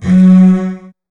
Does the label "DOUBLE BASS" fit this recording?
Yes